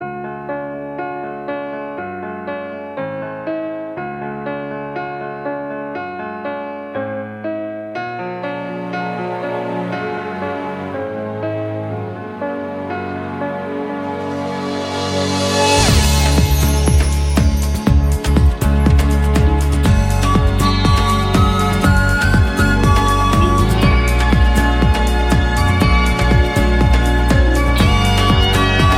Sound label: Samsung original remax Ringtone; tonos para teléfonos móviles SAMSUNG